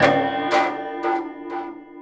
Void Perc; The Void; Processed Drumkits

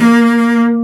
CELLO A#3; AKAI S-Series CD-ROM Sound Library VOL-2

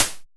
MKS NZ SHOT, TECHNO; Drums In Your FACE!

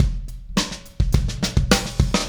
Beat Fill; Sonic Foundry (Sony Creative Software); Indie Rock Anthems

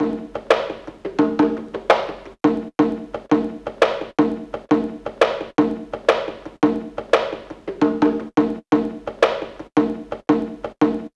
Percussion, cinematic funk

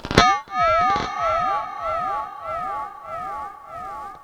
SPACEY R, Funk Guitar; Zero G - Funk Guitar

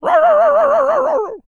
DOG BARK, VOCAL FX

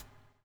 BUBL, BUBBLE; Spectrasonics - Backbeat

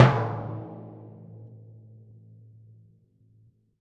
A2 f, Timpani, forte; PowerFX; Downtown Orchestra; scales

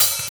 OP HAT; East West; Funky Ass Loops

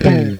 SLIDE DN, FUNK CHORDS, Guitars; Spectrasonics; Hans Zimmer Guitars VOL-1

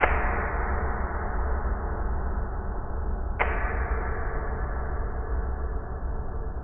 Gong Brush Hit, Ambient Metals; Sonic Foundry (Sony Creative Software) - Ambient Atmospheres & Rhythms

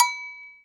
Agogo Bell Mute Low Hit; Sonic Foundry (Sony Creative Software); Joe Vitale Latin Percussion

